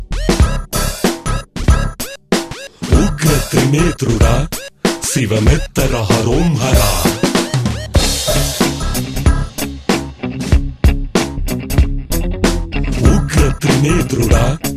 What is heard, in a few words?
Telugu Ringtone